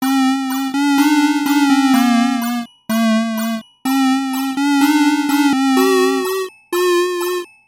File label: anxiety spooky